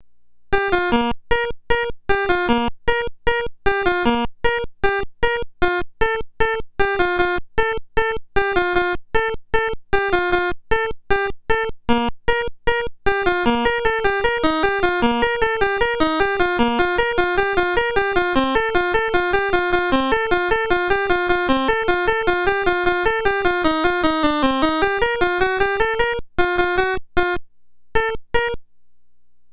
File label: PIANO